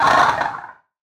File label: damage; FRACTALGUARDIAN1